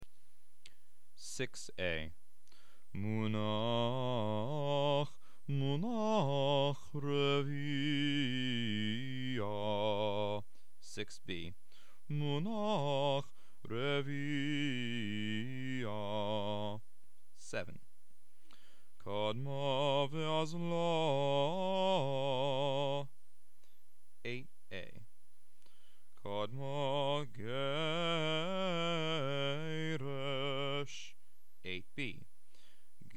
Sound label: Haftarah Trope D